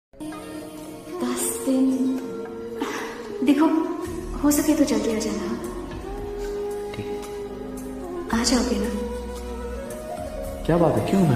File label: sad status; ghajini; love